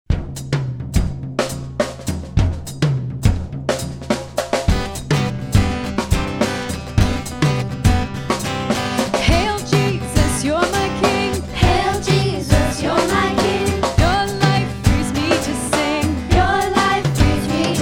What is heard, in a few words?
Victory Chant; Vacation Bible Camp